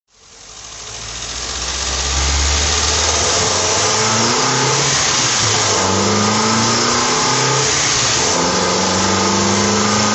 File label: sunbeam alpine mk2 drive